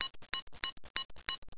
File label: Beep Watch Timer